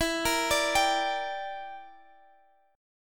G Minor E, chord; Gm/E arpeggiated

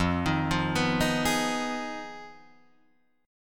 F Minor 7th Flat 5th F down Guitar Drop D

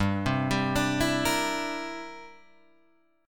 C 9th G down Guitar Standard; C9/G arpeggiated

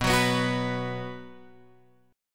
B 5th B, chord; B5 Chord {7 9 9 X 7 7} strummed

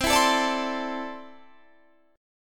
C 6th Add 9th C down Guitar Standard; C6add9 strummed; capo=3

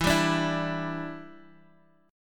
E Minor 7th, down

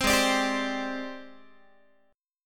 G sharp Major Sharp 11th, Guitar; strummed; 15,16,17,17,16,x; 4A,4E,5A,5D,5G#,6C#; G#M#11

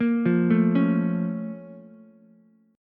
Bb Minor Major 7th Bb down Ukulele, BbmM7 chord; arpeggiated; JGuitar

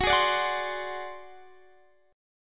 Ab 7th Flat 5th, chord

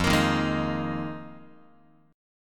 F Minor 11th, Guitar; Fm11; strummed; x,1,1,1,0,2; JGuitar

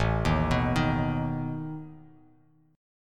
A Suspended 2nd Flat 5th A down Bass Standard; Asus2b5 arpeggiated

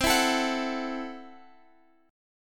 C 6th Flat 5th C down Guitar Open F; strummed; JGuitar

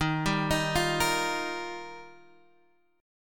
D sharp Major Add 9th; D#add9 arpeggiated; 11,10,13,10,11,x; JGuitar

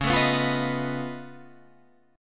C sharp Augmented 9th C sharp down, chord

